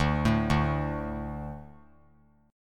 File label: D 5th D, down; D5 arpeggiated; JGuitar